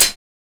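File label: HH, hi-hat; X-Static Goldmine 1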